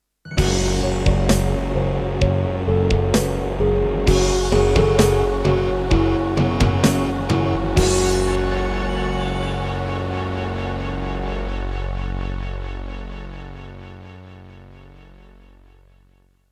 Korg Audio Gallery AG-10; Doom (Sigil); Introduction

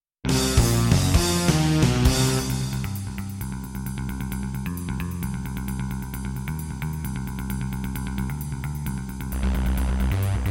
Roland JV-1080; sigil doom episode 5

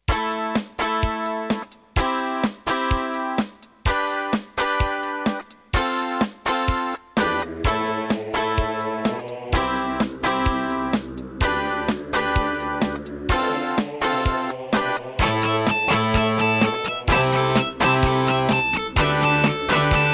Korg Audio Gallery AG-10; raptor call of the shadows

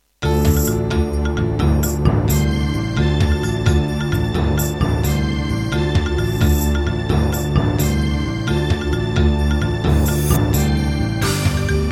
Serdaco Dreamblaster X2GS BURAN V1.1; Heretic

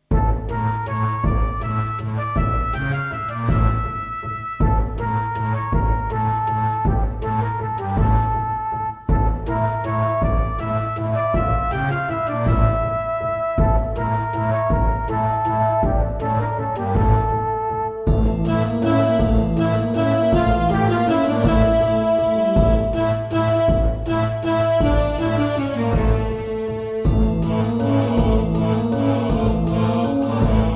Serdaco Dreamblaster X1

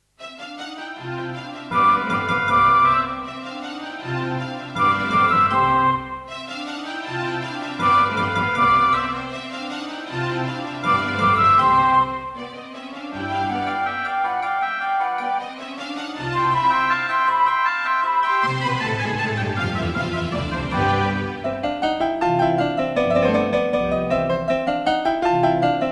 Alesis NanoSynth; classical; Mozart; Concerto K467 partial

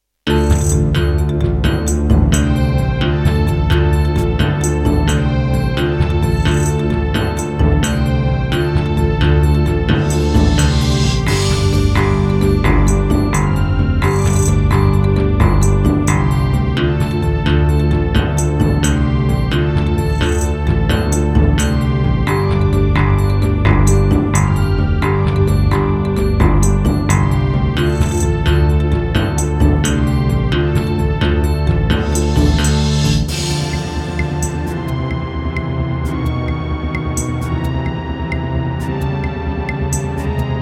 Terratec MiniWaveSystem WT64; Heretic

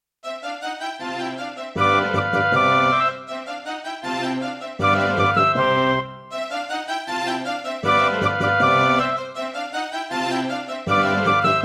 Roland SC-88ST Pro; 88MAP; classical; Mozart; Concerto K467 partial

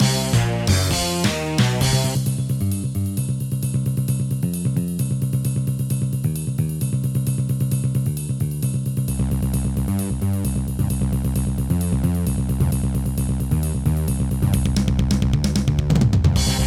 Casio CTK-800; sigil doom episode 5

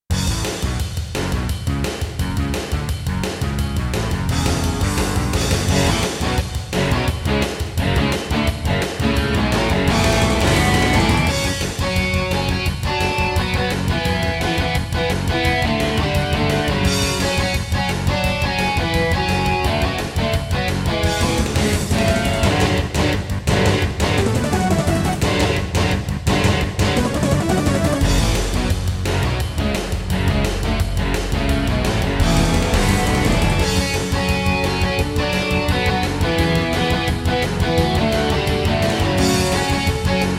Intro, Roland SC-88ST Pro; Duke Nukem 3D

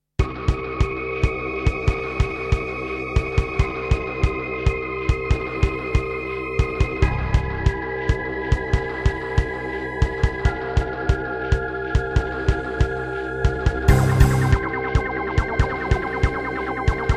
Roland SC-8820; sigil doom episode 5

